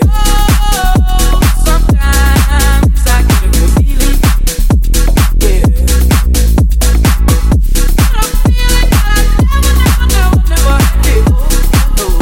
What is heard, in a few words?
TECH HOUSE